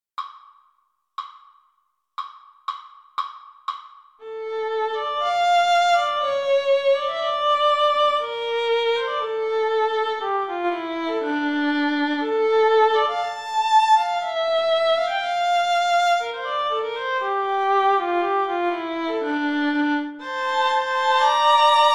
VLN, Violin Duet

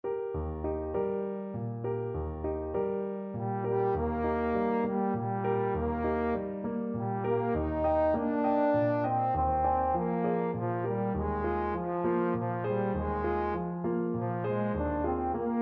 lullaby, trombone

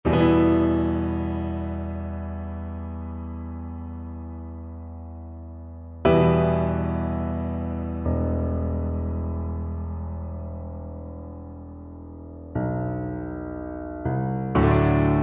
scalerica, viola

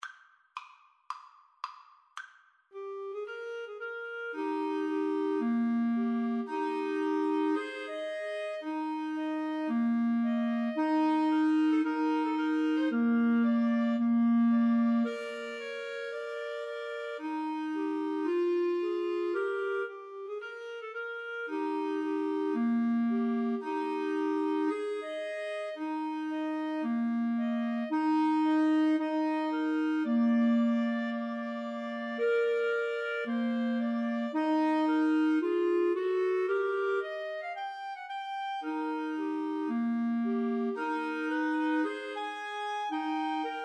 Clarinet Trio

